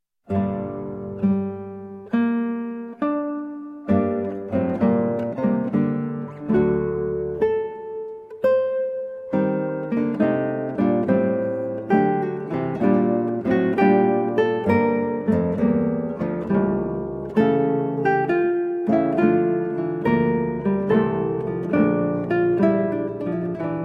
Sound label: Adagio from Recorder Sonata in D Minor, Guitar; HWV 367a; George Frideric Handel